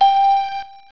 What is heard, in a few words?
pain; quake2; tourney; battlebrowzer